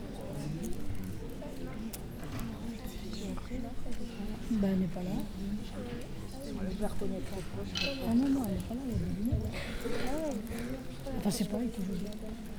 theater, ambiances; CosmicRage

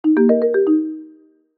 bingo board; general bingo

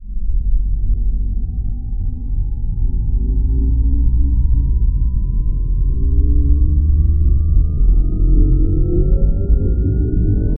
warp acceleration, ships movement

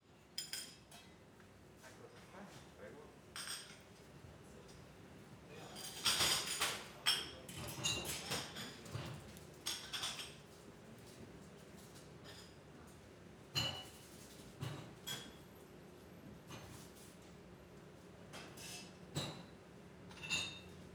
coffeeshop; ambiances; Cosmic Rage

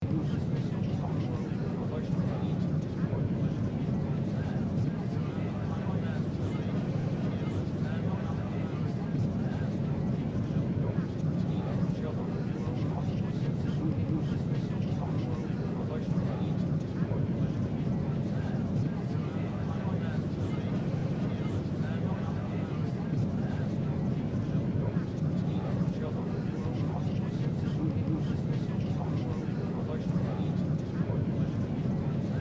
merchant ship, ambiances

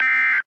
bad weather, ships

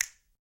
snap

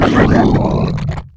prepare, alien; combat; creatures

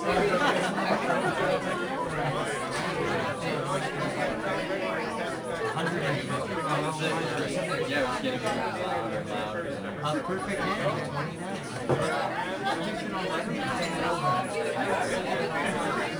lounge, ships rooms

